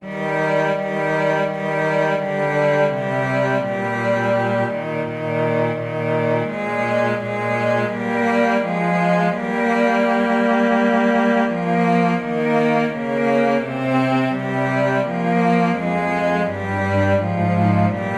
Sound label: cello duet; There Is a Green Hill Far Away